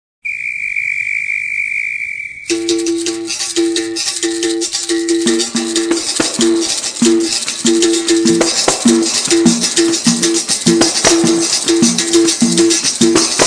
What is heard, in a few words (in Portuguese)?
toque samba de angola, capoeira